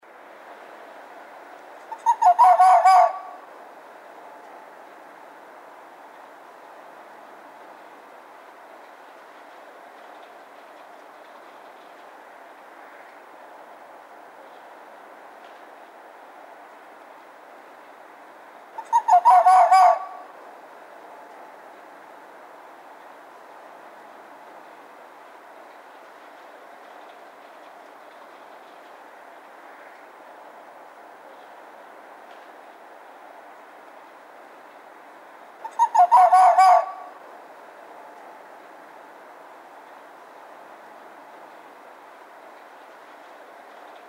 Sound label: red fox barks